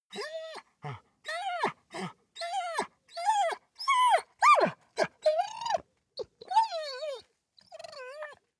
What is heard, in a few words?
dog whine; natur-tiere; hunde